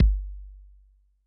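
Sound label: bass drum electronic, Kick, Yamaha DTXpress-II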